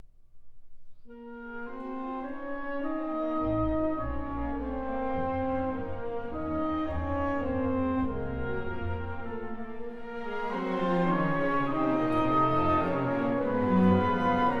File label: Variation 4 Andante con moto; Ivan Fischer; Brahms; Haydn Variations